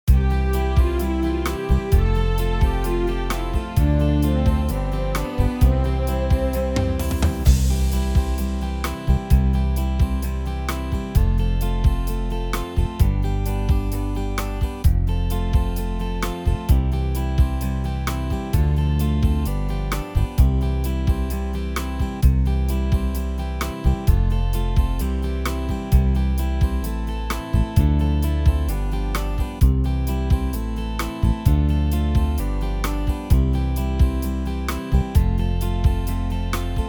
backing; bassoon folk

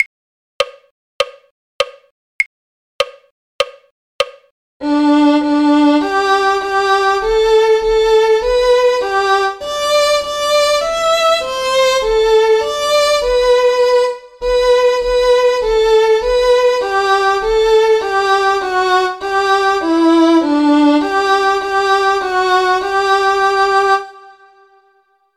violin; carols

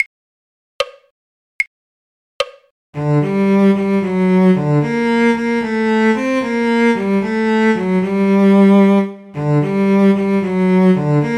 cello; the miller of dee; folk

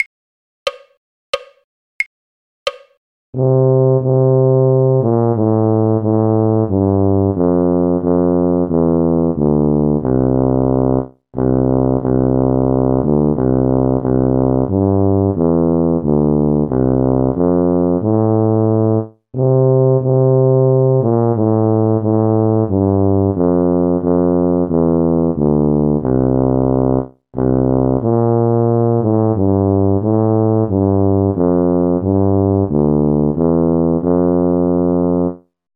tuba; carols

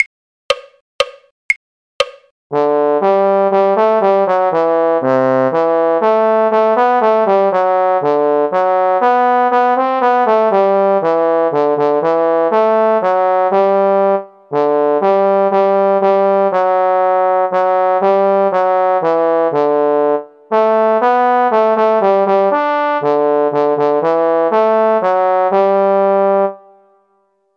trombone; carols

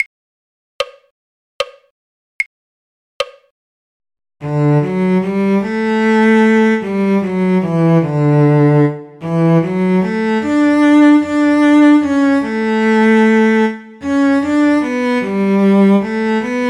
cello; barbara allen; folk

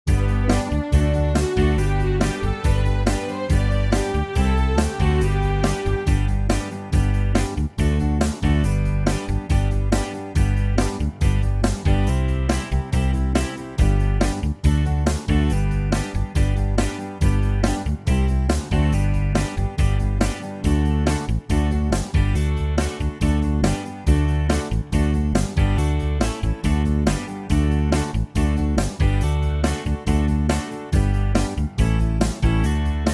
backing, folk; bassoon; soldier soldier wont you marry me

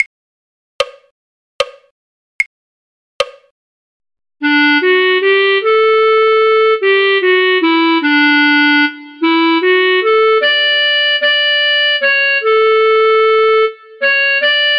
clarinet; barbara allen; folk

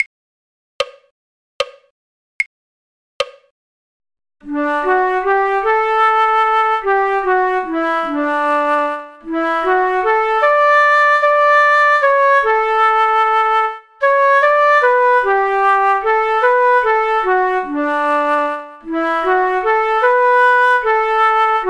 flute; barbara allen; folk

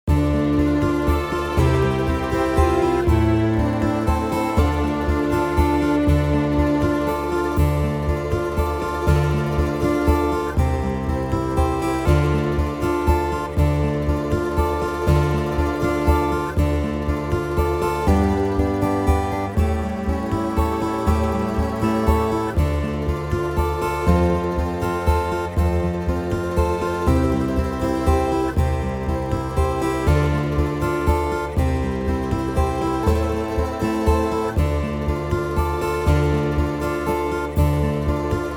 backing, folk; bassoon; scarborough fair

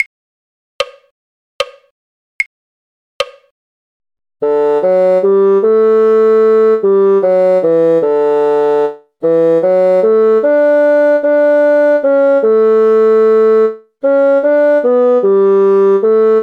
bassoon; barbara allen; folk

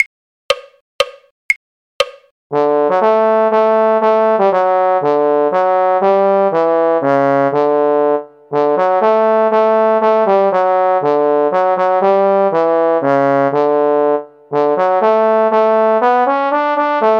trombone; carols

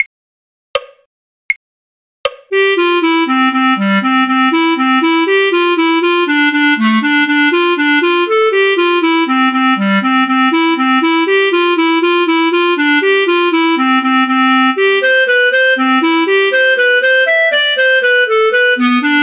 clarinet; the irish washerwoman; folk

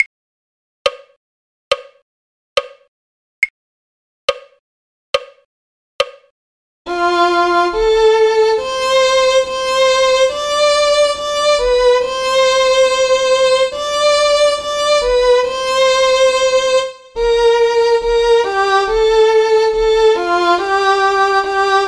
violin; carols

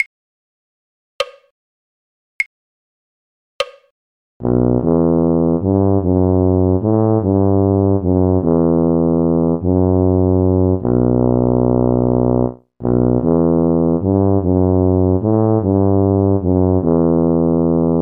tuba; carols

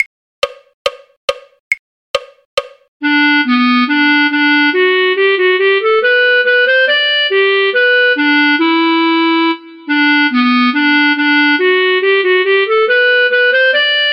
clarinet; soldier soldier wont you marry me; folk

